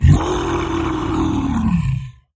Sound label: aggro, monstres; deadmaze